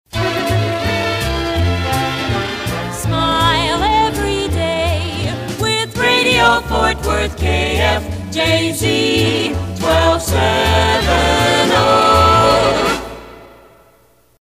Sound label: Smiling Signature; KFJZ; PAMS Numbered Series